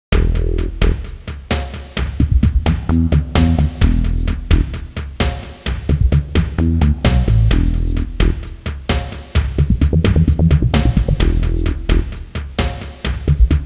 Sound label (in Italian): suonerie musica ritmica; rhythm